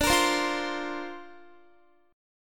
D-Minor 7th Sharp 5th, D, Guitar, 4E, 4A, 5D, 5G, 5C, 6F; strummed; x,17,20,17,17,19